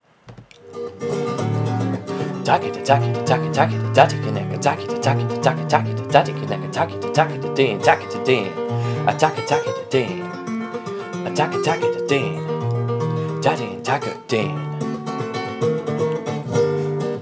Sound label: Konnakol rumba; flamen konnakol